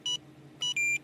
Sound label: beep